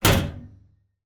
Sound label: household door metal meter cupboard external close; zapsplat